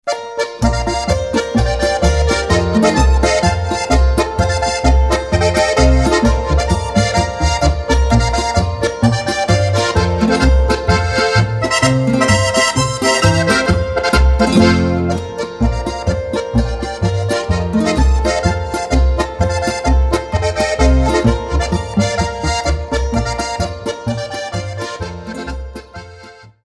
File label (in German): polka; gotzentalpolka